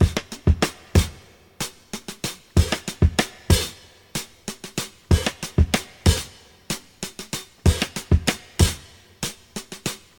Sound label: 94 bpm fresh drum loop e key, Free drum groove - kick tuned to the E note. Loudest frequency: 1685Hz